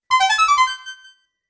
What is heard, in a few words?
lasers Text